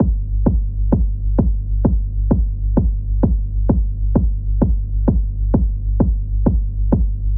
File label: KICK 130BPM